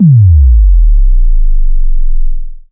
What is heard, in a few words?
thump